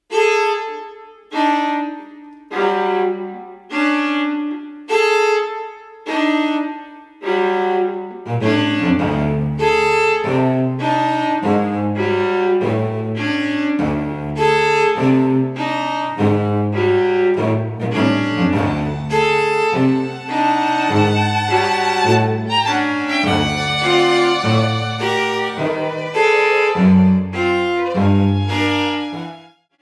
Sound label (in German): marsch